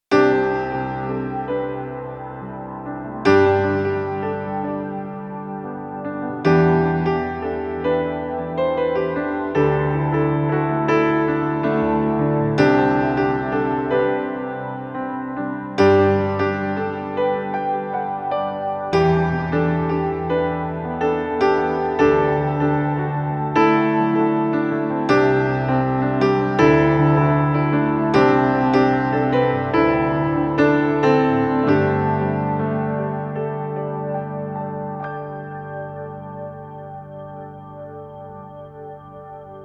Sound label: Nord Grand shimmer upright